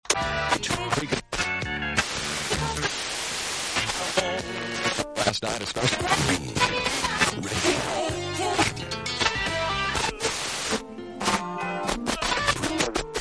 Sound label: radio Tuning